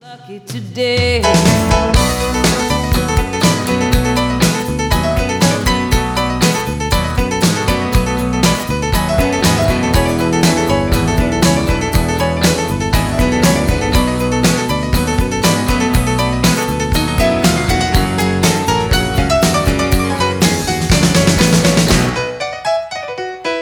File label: Piano Solo